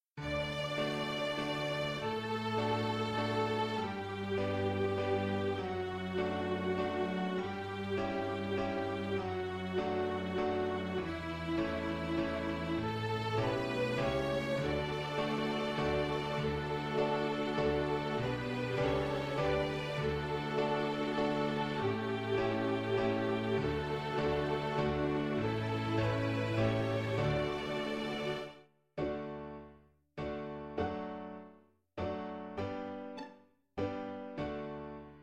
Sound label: Waltz